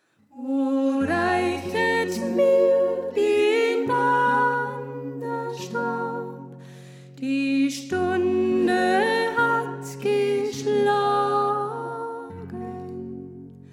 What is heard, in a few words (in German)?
Sopran; O Reichet Mir Den Wanderstab